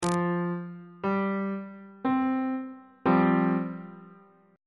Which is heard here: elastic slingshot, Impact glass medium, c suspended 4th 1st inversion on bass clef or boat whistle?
c suspended 4th 1st inversion on bass clef